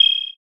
fairy

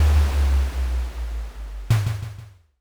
castle gate

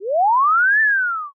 ghost appear

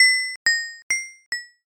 coin drop

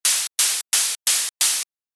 saw

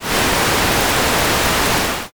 fire

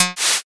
bow shot

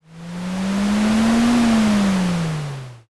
ship pass

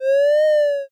picothink

